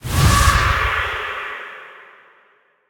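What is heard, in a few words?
ghost exorcise; smite; zhongkui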